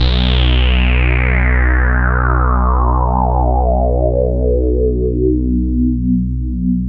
Juno sweep, synths; E-MU Formula 4000 Series Vol. 3; Analog Odyssey

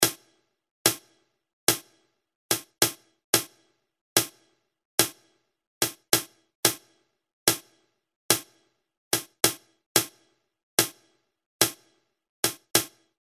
High Snare; Industrial

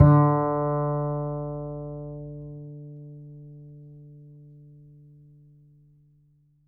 Upright Acoustic Bass

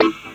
CLAVINET; Ragga & Dub Reggae

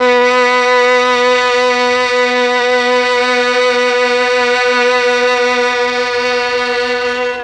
MEL STR, Legendary M400; ILIO; Propeller Island

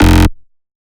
Bass F, Bass Synth

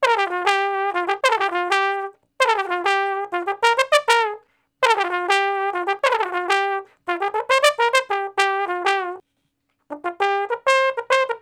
Trombone Straight (G); Solos

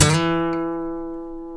F#, funk guitars

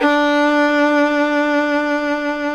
fiddle F D4; Country; FIDDLE NOTES

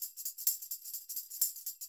TAMB, Percussionworks; Sampleheads; NYC Percussionworks

